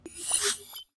hint; Ben 10 - Spot The Difference

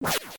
swoosh; The Loud House - Extreme Cardboard Racing; NextlevelGames

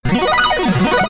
super basket; Nickelodeon Basketball Stars 3; ThrillGames

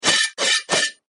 attack

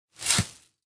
dig Hole; Food Farmer

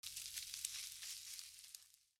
Weed Kill; Bunnicula - Crop Control

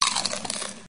crunch; Ben 10 - Tomb Of Doom

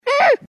hit; Danger Mouse - Ultimate; kong

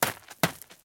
run gravel; Ben 10 - Hero Time Game; ThrillGames

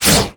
impact; Fruit Slicer

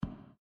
slime footstep; Adventure Time - Elemental; ThrillGames

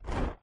whoosh; Bunnicula - Juicy Bites

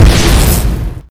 big kick; Cartoon Network - Toon Cup 2020

